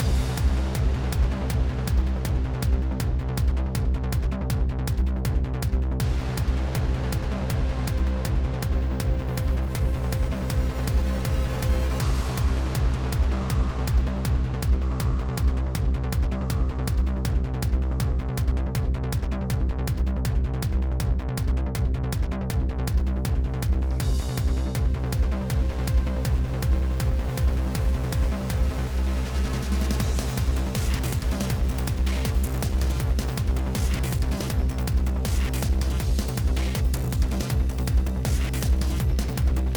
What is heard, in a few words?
Chase 8CH 160BPM